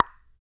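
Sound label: vox percussion, Vocal Planet Jazz FX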